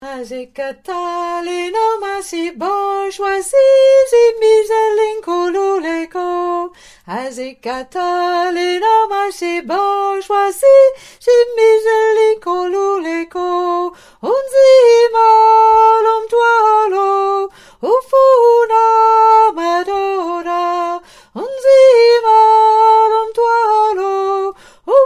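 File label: Soprano; Asikatali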